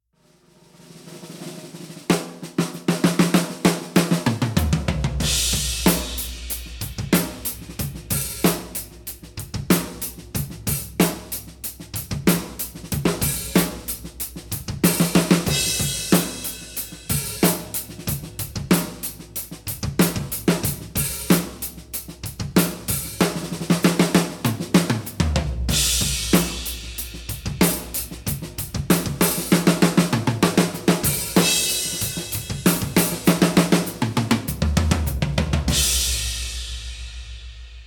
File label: Groove